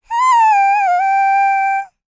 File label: LICKS, Vocal Planet Jazz FX; Spectrasonics